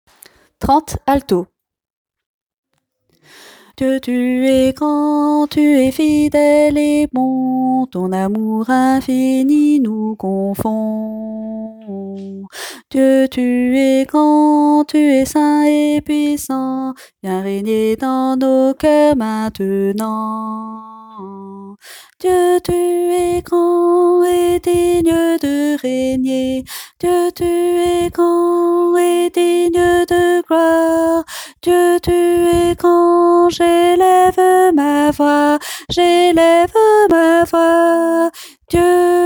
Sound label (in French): alto